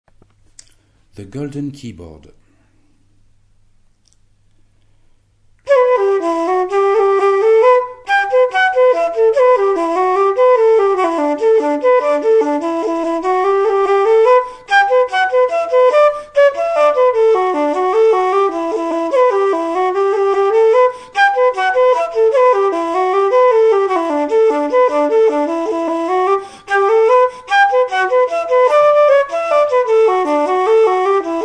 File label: flute